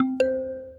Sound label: Stereo Win, notifications; Lenovo; ZUI 13